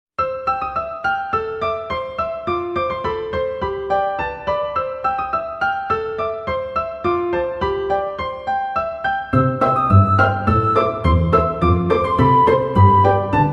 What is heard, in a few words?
little girl beat instrumental; english for kids